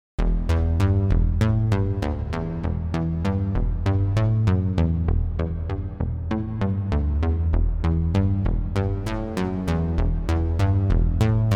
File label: Squish Steel; Kush Goldplate